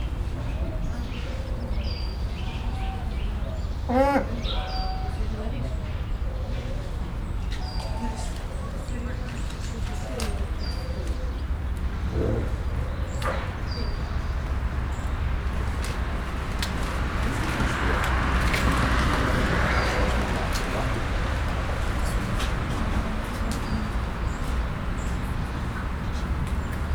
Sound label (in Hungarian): pusztai sas; debreceni zoo 2019; neha autok is